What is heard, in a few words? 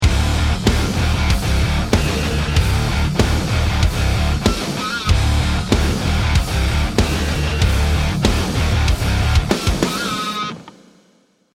bomb ten sec count; Counter-Strike: Global Offensive